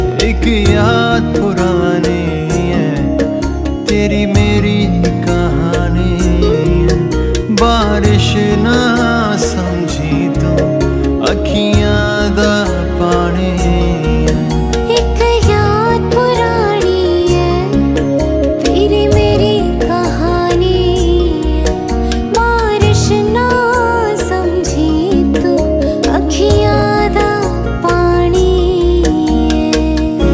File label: Punjabi Ringtone